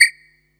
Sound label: Minimal Perc; Minimal Percussion